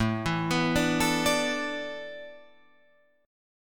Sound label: A Suspended 2nd Suspended 4th, Guitar, Open F; Asus2sus4 arpeggiated